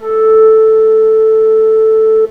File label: flute; MATLAB